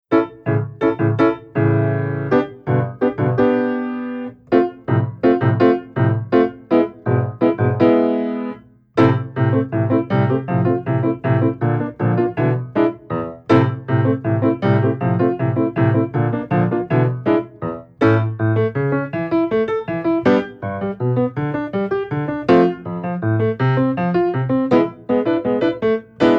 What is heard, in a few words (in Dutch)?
Akkoorden drummen, Piano oefeningen